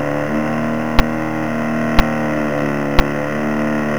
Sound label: coax muff sound; muffler